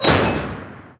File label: Impact; Ed Edd n Eddy; Adromulus Pack; EEnE